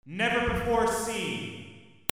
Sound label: PEOPLE